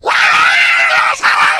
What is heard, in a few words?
rage at victim, infected, male